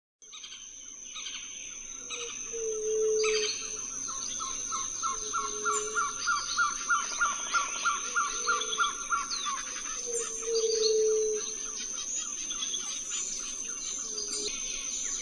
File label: Trogon violaceus